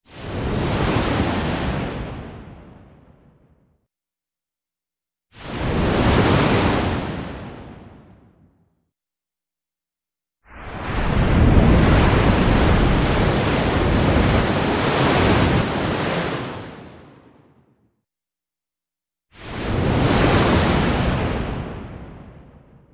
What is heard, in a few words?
Explosions, Softer Attack For Underwater Effect, 5x